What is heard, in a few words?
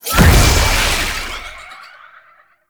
Jump Pad Launch; Warframe; Shadowgrapher